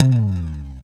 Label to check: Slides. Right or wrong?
Right